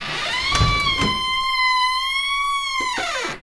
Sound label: Boss Door open; Seer; GamigoNA